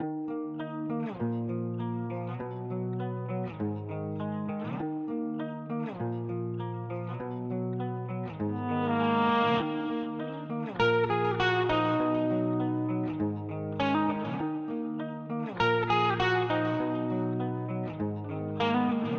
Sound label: Guitar Loop, alternative; ForBrokenGirls; 99Sounds x Monosounds; Instrument Loops; Guitars